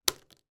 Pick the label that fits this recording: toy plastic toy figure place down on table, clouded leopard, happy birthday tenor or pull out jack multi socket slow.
toy plastic toy figure place down on table